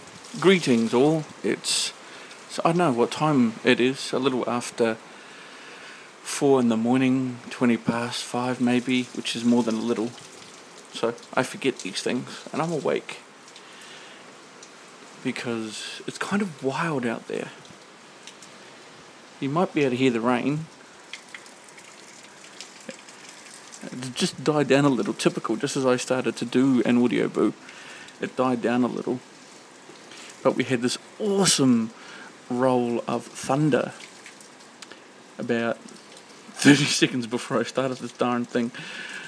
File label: an early morning thunderstorm